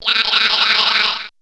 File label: "YAEYAEYAEYAY"; VOICES